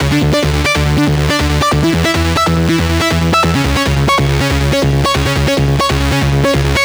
NRG Arp, trance

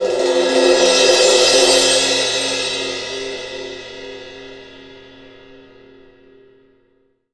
Cymbal Swell; Essential Percussion

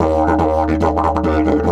140, DIDGE RIFFS

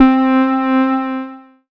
synth, Euro Style, Dance Synths; Best Service ProSamples vol.37 - Dance Synths

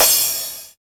TR-909 Crash; Roland BK-5

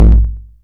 kick, Korg Electribe ER-1; Kit 20

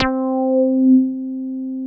TB 303 BASS

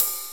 Trombone, SNES; Star Ocean

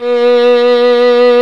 SOLO STRINGS

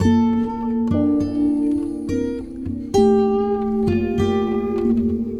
Guitar And Synth

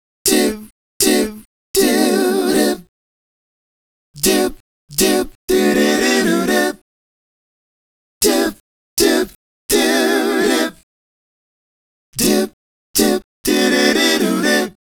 Male Group, Hip-Hop and RnB Vocals

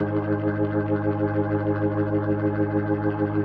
G#1F, B3, Vintage Keyboards

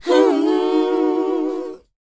WHOA C#, Vocal, R&B & Dance; ILIO; Vocal Planet

